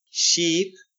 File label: sheep